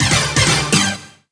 new record; Frontal Assault

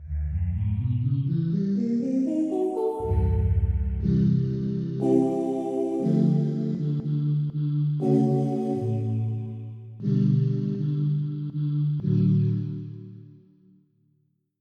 Brightness; EverQuest

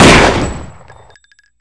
pistol; KINGPIN; weapons

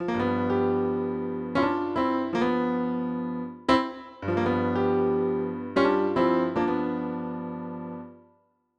PIANO; Ivories

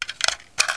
load

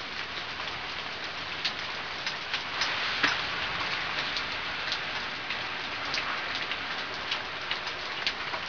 rain; 12000 Graphics Pack - A Complete Graphics Collection For Your PC

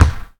bounce; Soldat

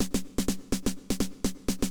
SNARE HOUSE 125, Drum; eJay

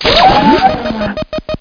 pain

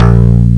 bass; Atari Mega Archive - Volume 1; music

